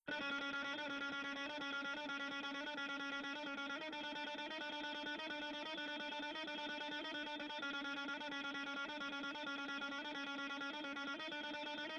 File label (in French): Guitare; Assassin